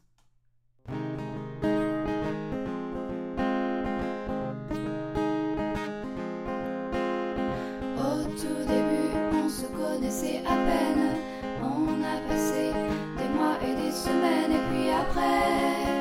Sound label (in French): version chantee normale